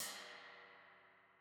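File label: RIDE HIGH HIGH RIDE, analog recorded drum; Sonimus; s series drum pack; STONEQ 4K; SATSON; SATSON CS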